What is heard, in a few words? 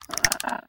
monster